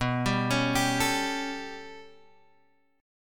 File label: B 7th Suspended 2nd Sharp 5th down Guitar DADGAD, chord